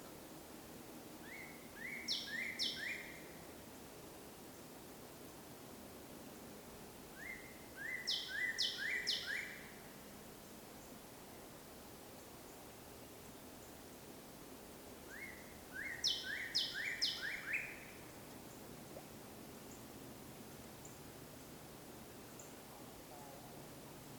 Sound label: Northern Cardinal, Falls Lake